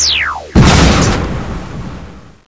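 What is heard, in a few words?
torpedo hit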